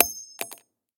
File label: Shell heavy carpet; STALKER; IWP